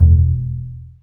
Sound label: STR, STRINGS; Roland - LA Composer vol. 1